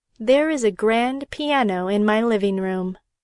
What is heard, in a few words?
piano